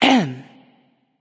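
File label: clear throat, female; smite; voicepack; hera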